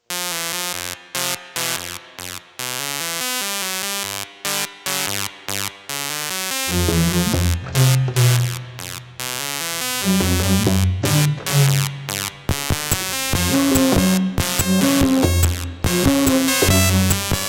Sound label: etude for contrabass flute and ti 83 plus calculator